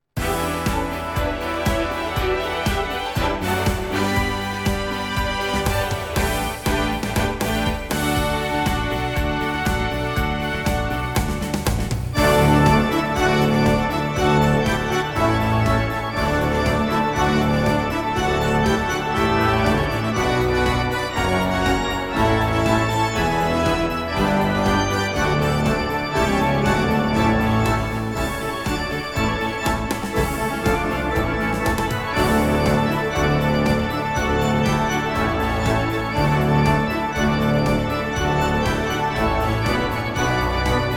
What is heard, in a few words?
Fanfare